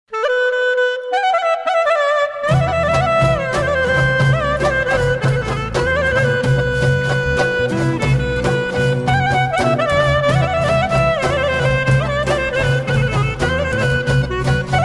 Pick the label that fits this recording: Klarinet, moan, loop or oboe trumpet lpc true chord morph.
Klarinet